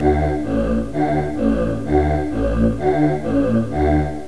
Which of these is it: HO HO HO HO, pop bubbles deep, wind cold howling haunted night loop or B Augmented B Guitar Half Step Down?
HO HO HO HO